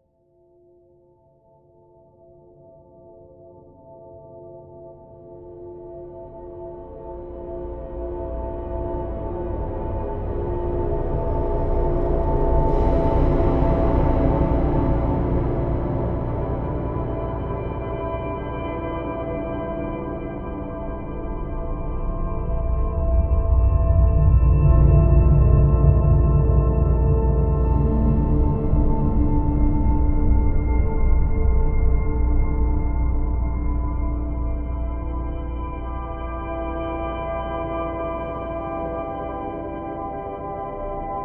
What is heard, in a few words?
ambient pad; Gregor Quendel